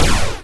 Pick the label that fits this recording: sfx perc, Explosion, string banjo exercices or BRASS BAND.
Explosion